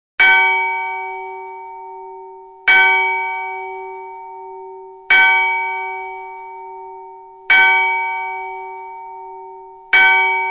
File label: Church bell sound